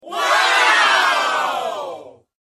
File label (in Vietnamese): Am thanh tieng wow cua bon tre con